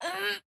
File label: Overhead Wind Up; Jackie; Going Under